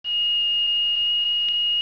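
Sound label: smoke alarm; 12000 Graphics Pack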